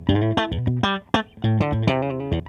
POP LICK, Funk Gtr & Wah; PlatinumPhattCD2; E-MU Producer Series Vol. 8 – Platinum Phatt

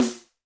percussion, Roland TD-20

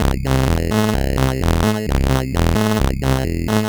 Sh101 Loop, 130BPM

